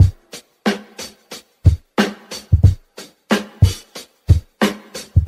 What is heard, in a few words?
headnodder, boombap drum loop; Drum loops